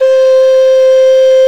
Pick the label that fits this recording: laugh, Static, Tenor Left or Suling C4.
Suling C4